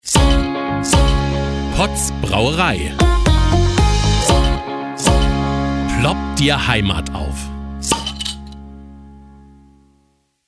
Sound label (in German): Soundlogo; Pott´s; corporate sounds produktion